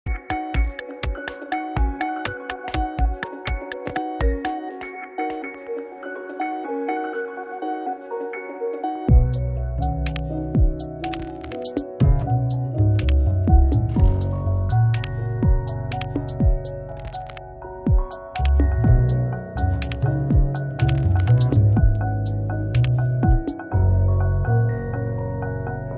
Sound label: piano